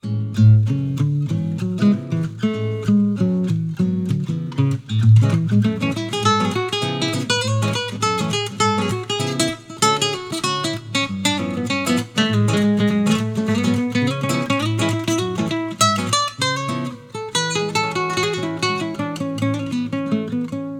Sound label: Jazz Comping and Solo